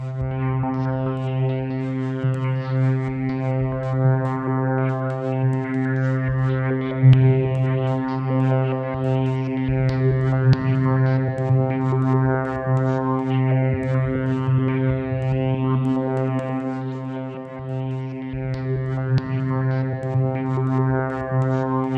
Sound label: C2, Synth; NiceBeats